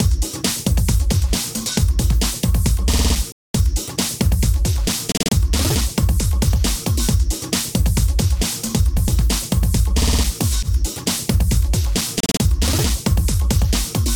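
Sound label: drum beat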